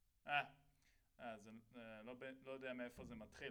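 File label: SNR Top, snare top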